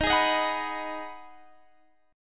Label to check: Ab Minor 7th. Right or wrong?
Right